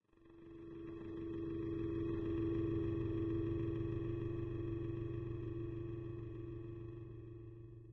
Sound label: curved spawn